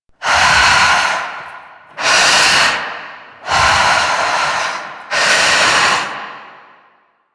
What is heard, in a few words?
Breath Heavy Slow Loop; New War